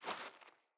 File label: Boot Walk Gravel, Footsteps; Characters; Enemy; Boots; Sinecure; Melee Grunt